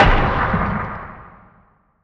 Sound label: asteroid damage oxide big; Factorio